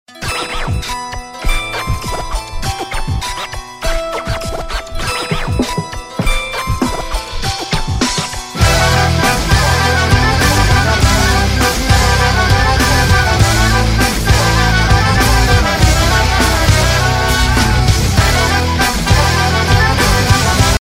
sad music remix ringtone

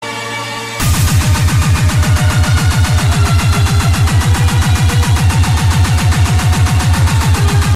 iphone x annoying ringtone

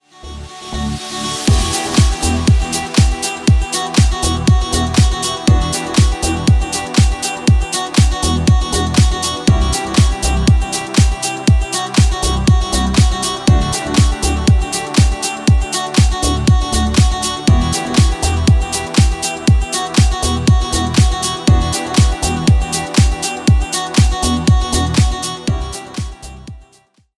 ringtone cool ringtones

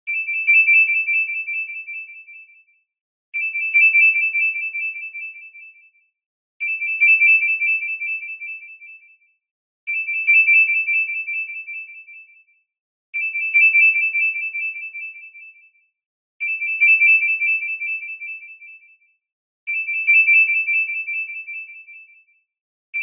best peal nokia

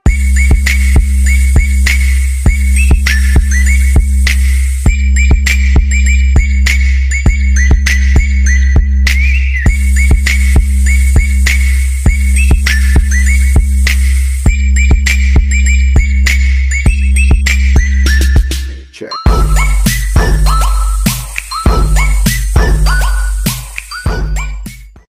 jingle bell instrumental ringtone

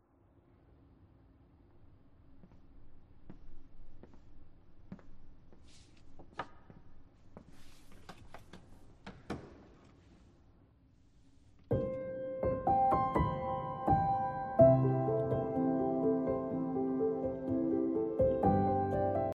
samsung galaxy s9 ringtone original; samsung ringtones

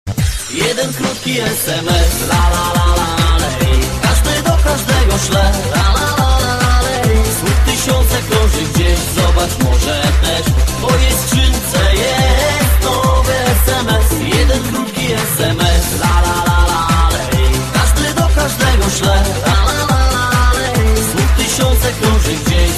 iphone polish sms disco ringtone

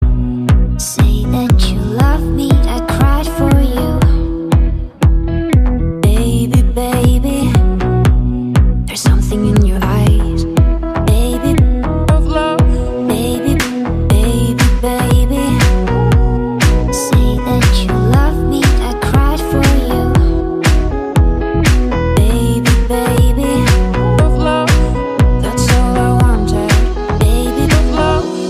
english ringtone

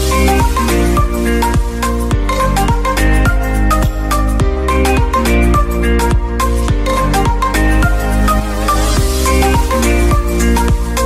game ringtone; galaxy ringtones

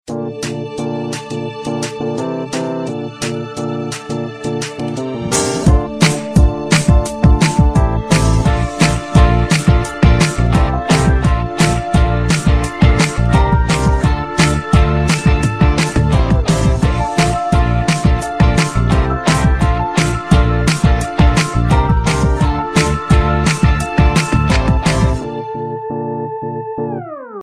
beautiful party music ringtone

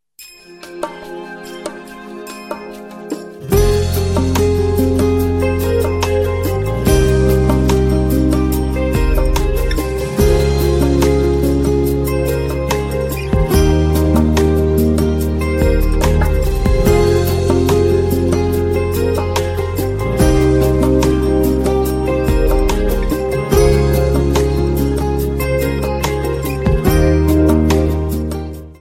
morning tone ringtone

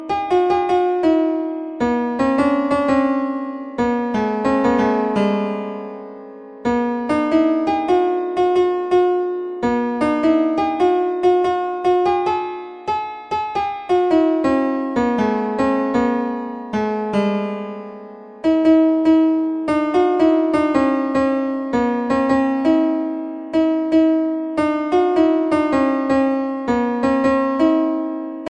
hindi music ringtone, bollywood

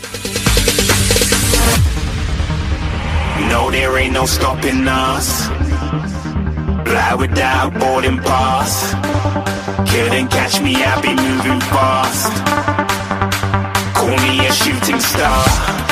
mood off bgm english ringtone

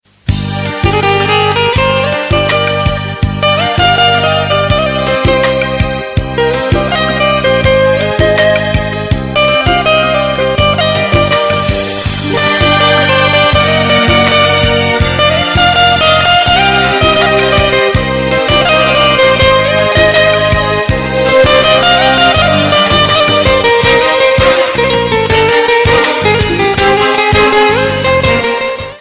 new gitar ringtone; samsung ringtones

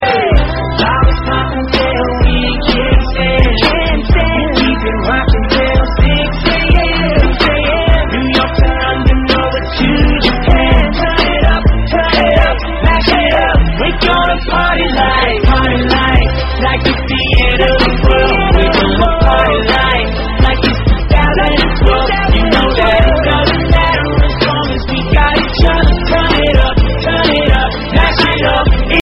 party light tone ringtone

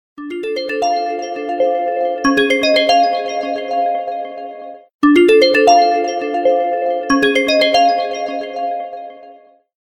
cool samsung ringtone

